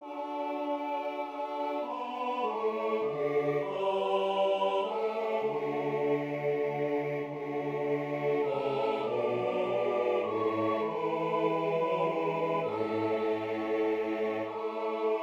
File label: Bass Prominent